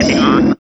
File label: RADIO, 160 BPM